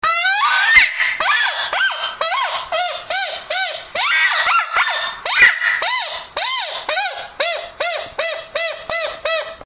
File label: predator call